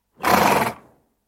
horse huffing noise

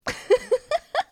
giggle

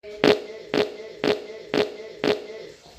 fart

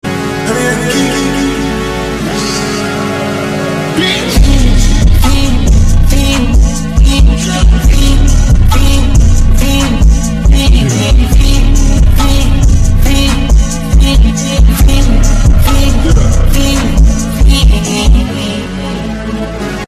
slowed bass boosted

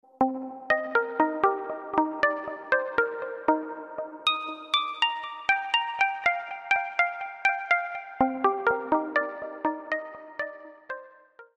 synth pluck

